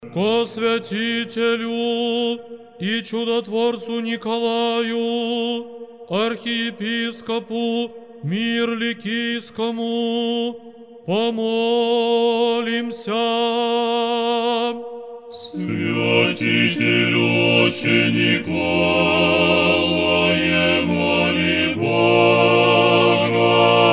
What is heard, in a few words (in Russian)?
molitva, muzhskoy monastyr; sretenskiy stavropigial nyy muzhskoy monastyr